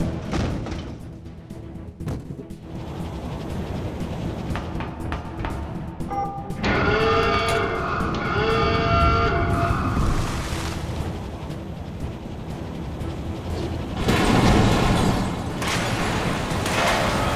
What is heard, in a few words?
Midtro Sfx; Doomtanker